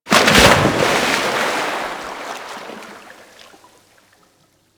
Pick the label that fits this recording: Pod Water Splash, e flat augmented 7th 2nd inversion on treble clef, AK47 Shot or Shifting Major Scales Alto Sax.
Pod Water Splash